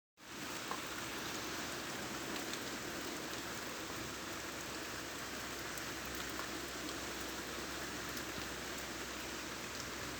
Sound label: green noise